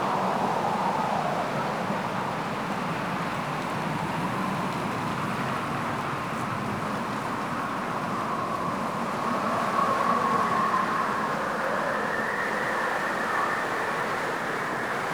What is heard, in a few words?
Settlement Exterior Ambience; Warframe; Grineer Settlement